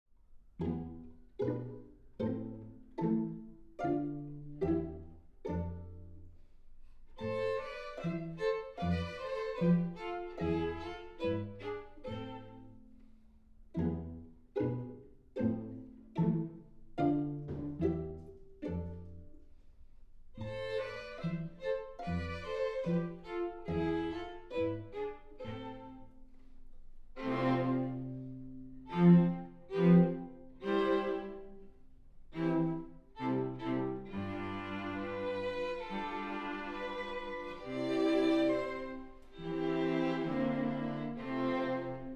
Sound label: without guitar